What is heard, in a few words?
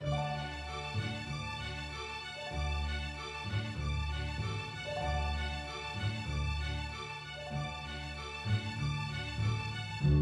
Loop; The Magicians Homecoming